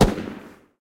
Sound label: firework Explosion; shangrila